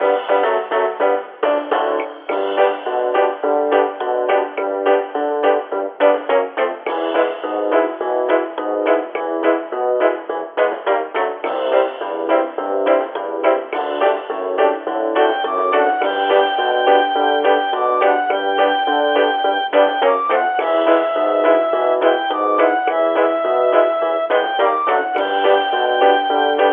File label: BGM; Adventure Player; psp